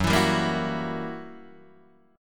Gb 7th Flat 9th Gb Guitar Open D

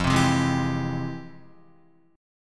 F Minor Major 9th, Guitar, chord; FmM9 strummed; JGuitar

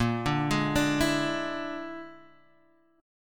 A sharp 7th Flat 5th A sharp down Guitar Drop D

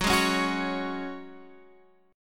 F sharp Suspended 2nd, Guitar, Drop D; x,9,6,6,7,9; down

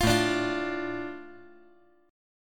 A Suspended 4th Sharp 5th, A

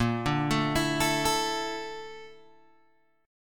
A sharp Major Sharp 11th, down, Guitar, Open G

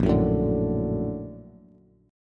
Bb Augmented Major 7th Bb, Bass; Bb+M7 strummed; JGuitar

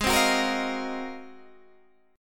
Ab 13th down Guitar DADGAD; Ab13 strummed

